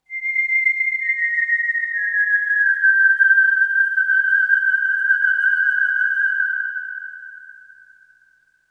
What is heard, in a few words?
Oneshot Synth